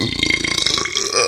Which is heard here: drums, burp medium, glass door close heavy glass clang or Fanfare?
burp medium